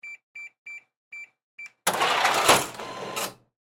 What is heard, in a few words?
Industry, Cash, Register, Beeps, Open, Drawer, Print, Receipt